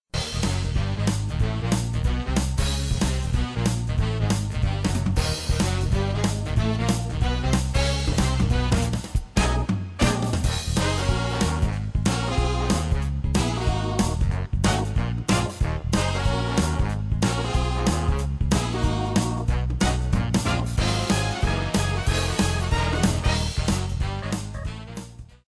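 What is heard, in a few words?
swing big band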